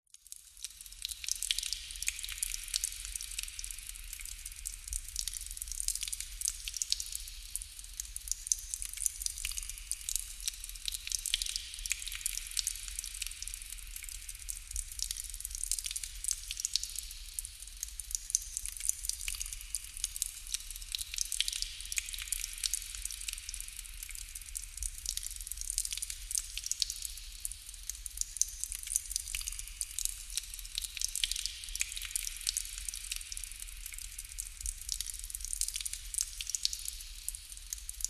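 nature water drips constant splatter reverberant cave or abandoned warehouse; zapsplat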